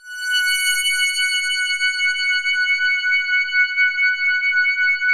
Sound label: 5th hard F#5; SPACIOUS; Music Store - Claudius Diemeter Schwerlos; SPACIOUS 1